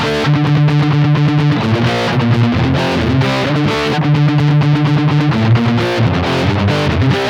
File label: rythm guitar